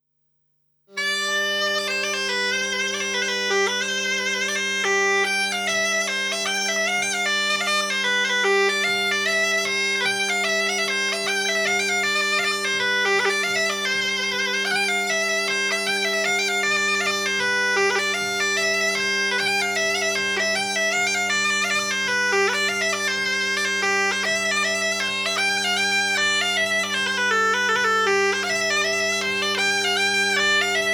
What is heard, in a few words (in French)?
Mazurka